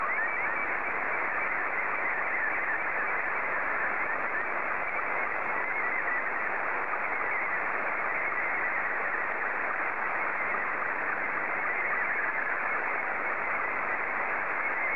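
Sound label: SSTV, Scottie 2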